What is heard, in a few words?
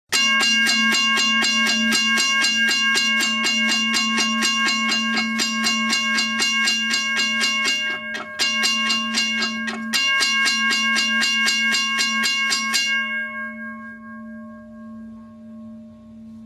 WRRS Mechanical; WRRS Mechanical #1